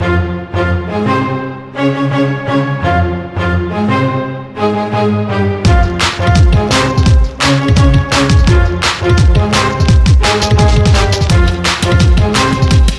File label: ORCHO SPLASH; escape from russia